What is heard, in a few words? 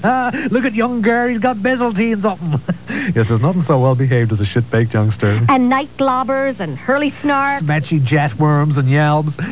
hurly